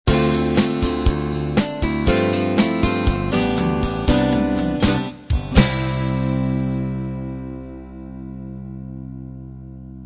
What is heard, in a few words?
light rock end